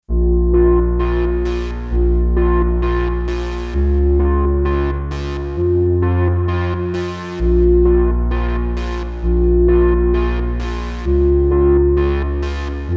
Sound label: SSL X-Logic Alpha Channel Synth EQ